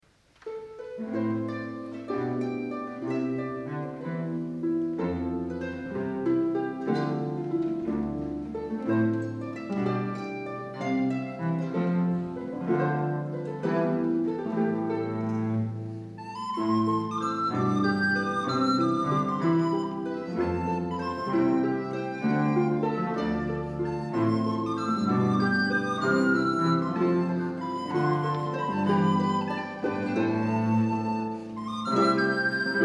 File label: Celtic Air